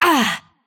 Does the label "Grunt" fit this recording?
Yes